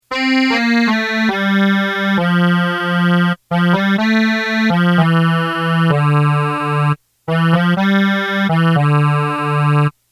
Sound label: melody; southern songs